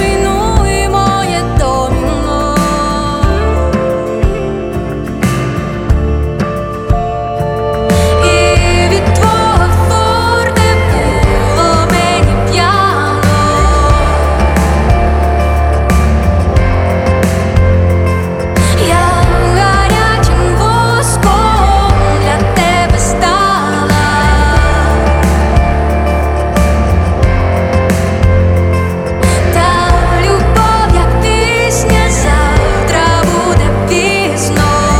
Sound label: Fortepiano